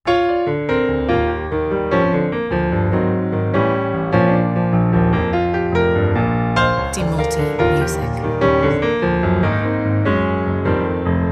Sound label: jazz; Nuansa ringan dan hangat, cocok untuk menyambut tamu di lobby hotel. Musik ini mencairkan suasana dan memberi kesan ramah sejak lan